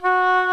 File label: WOODWINDS